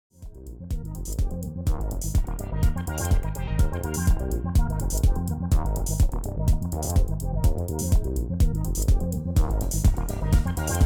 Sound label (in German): Roland DJ 808 TR S und FX